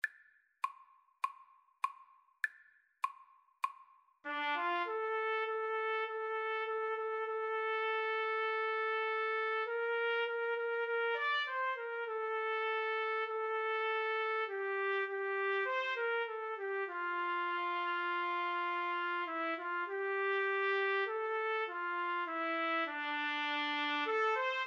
trumpet duet; Band Playalong

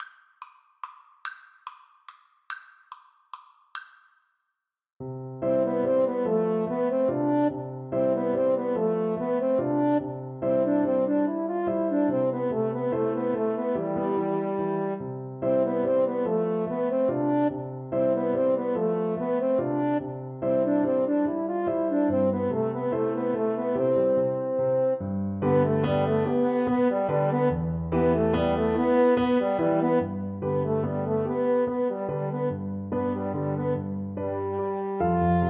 2 horn

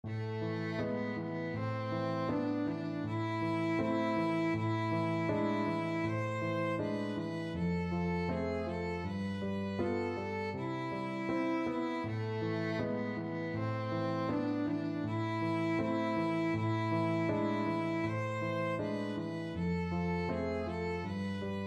with variation violin; Ai kuinka kauniilta kuuluupi

